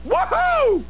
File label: Woohoo!; Homer